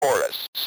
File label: chorus